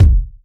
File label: original urban steel kick drum sound D sharp key